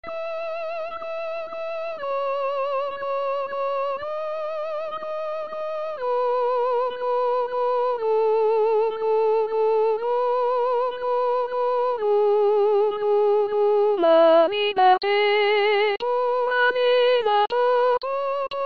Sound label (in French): Soprane